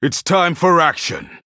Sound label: Male Human Attack